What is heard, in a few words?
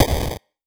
Snare, 8-Bit; 8-Bit Kit Volume1